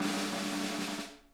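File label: snare roll, drum kits; Sonic Reality; Interactive Drum Kits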